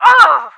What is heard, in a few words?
pain; action-quake2; actionrally